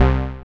BAS, Eurotech; Sounds Good

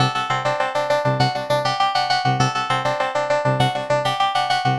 synth 100 bright C D F G A; Transmission-X

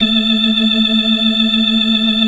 FAST VIB, ORGAN, TREMOLO VIB

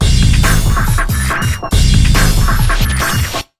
Big Beat Drumloops, 140; USB Soundscan vol.25; AKAI; TECH 140

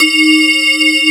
METAL BAZZ

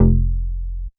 bass classic F; Polyend; ARC Noise

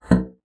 BASSOON; Xsample VOL-6

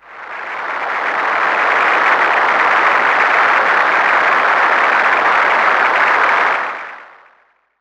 EFX, SFX KIT; Wizoo - Platinum 24 Electronic

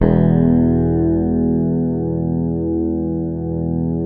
Fingered bass R; Sampleheads; Will Lee Bass Library

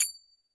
percussion, Roland TD-20

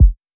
Kick Body

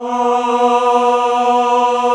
MALE B2, CHO AH

